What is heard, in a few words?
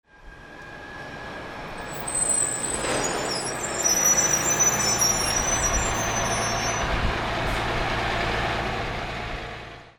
VEHICLES